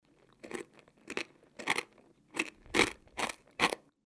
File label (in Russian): hrust suharej